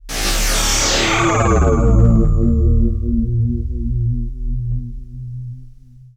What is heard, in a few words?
Zap Synth, Sci-Fi; Sound Design